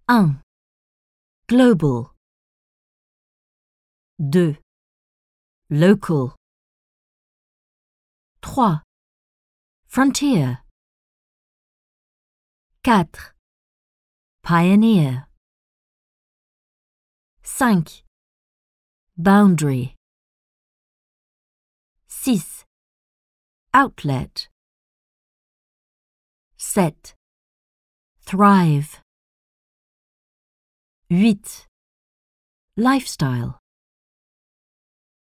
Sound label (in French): son techno